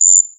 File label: Cicada Insect; Bolkmar